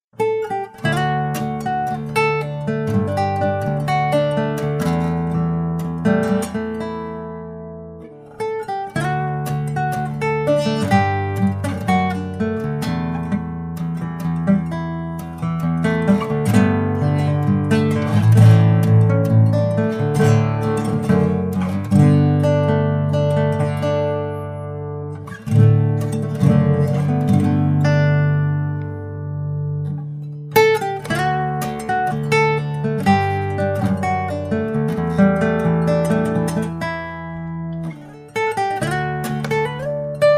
Indian Rosewood Crossover Guitar

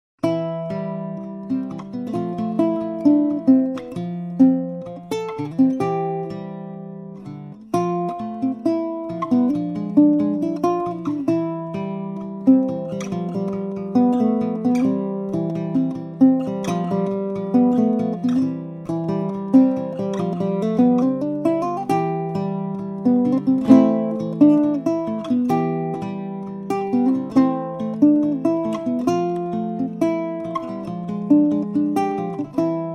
Maple Archtop Ukulele

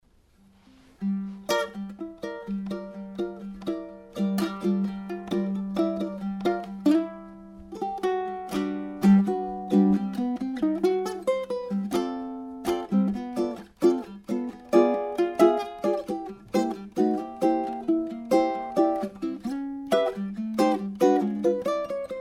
uke Wave

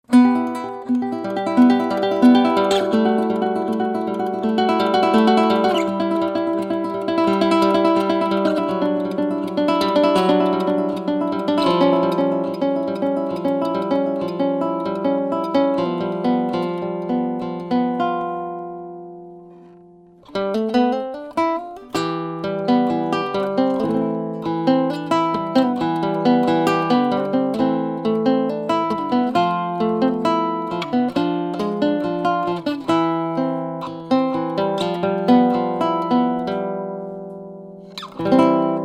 Archtop Tenor Guitar mic

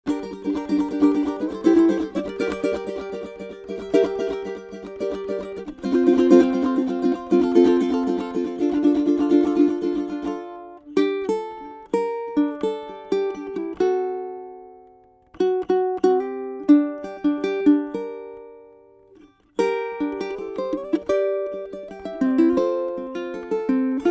uke

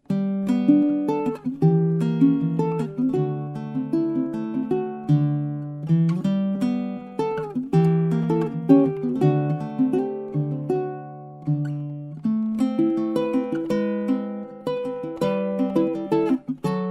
Uke waltz

